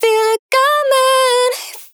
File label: 123 C MOMENT BV Fem Voc Dry